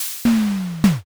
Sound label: hatch